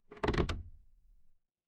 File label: door creak